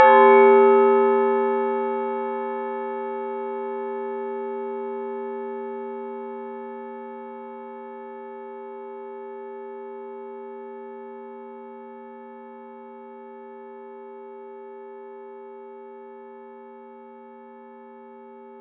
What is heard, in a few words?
synth; sound effects and sound reinforcement